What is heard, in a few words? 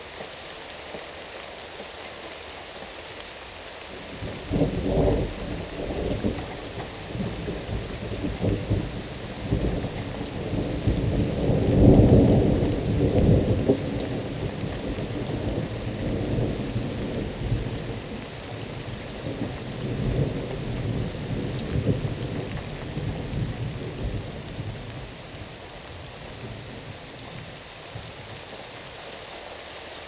Ambience Rain (Outdoors); SpongeBob SquarePants Employee of the Month